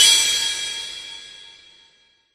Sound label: shock; animal